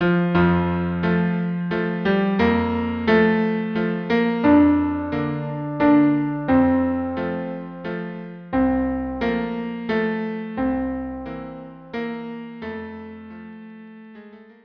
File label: cello duo; Un di felice; La Traviata